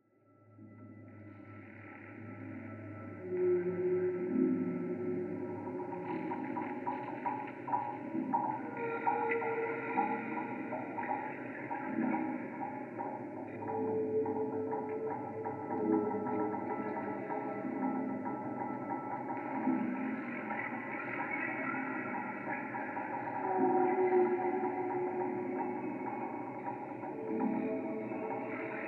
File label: Creaky Gate; Screaming Halloween